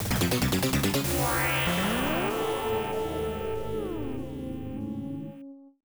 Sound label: Appear; lam mal x68000